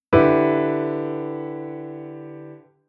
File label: Chord Hybrid; Jissen